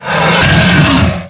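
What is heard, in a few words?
creature attack; Shadows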